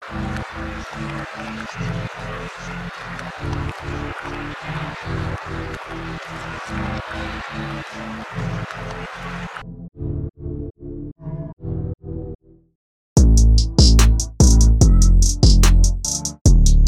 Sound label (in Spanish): pista trap 146 bpm